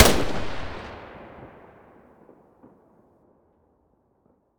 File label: muzzle vector mono, weapons